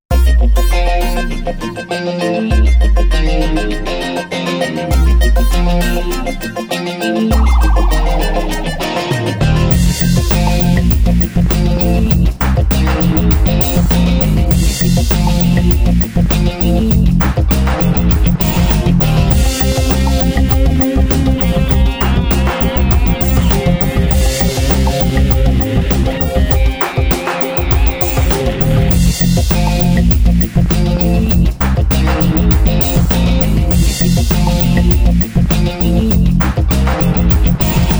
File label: Action Comedy